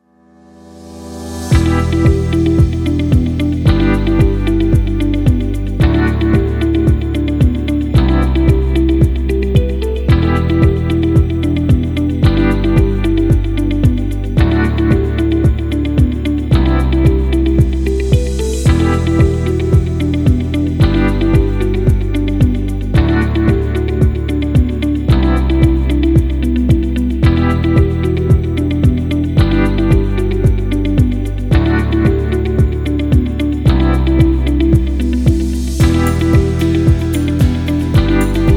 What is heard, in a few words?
Corporate